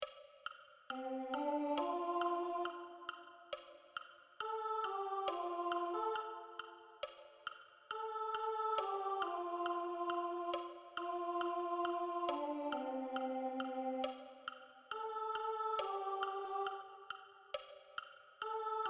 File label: Glory Glory Soprano, Gospel